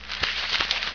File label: Sound RUSTLE; Kids' CD-ROM Bible Fun Pack; Education Publishing Concepts; NOAHDEMO